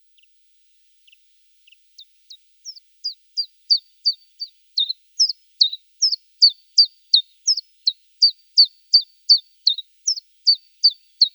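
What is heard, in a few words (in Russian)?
Penochka-tenkovka vostochno-evropeyskiy podvid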